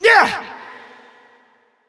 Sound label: Attack; Creature; Bolvar Fordragon